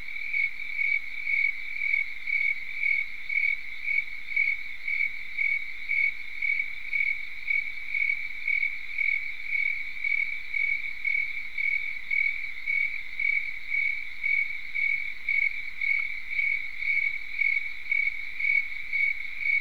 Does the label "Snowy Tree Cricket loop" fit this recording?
Yes